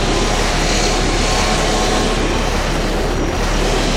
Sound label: Charge Forward